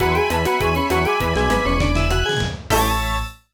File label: Haunted Woods Finish